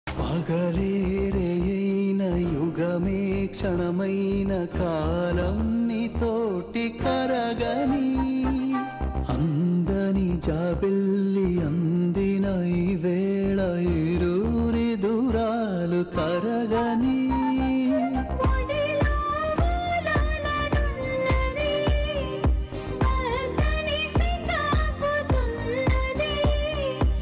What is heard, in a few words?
song telugu; ananthapuram 1980